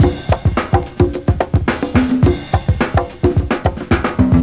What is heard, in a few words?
breakbeat; World of Sound; instruments; beats